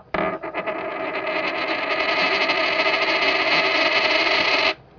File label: office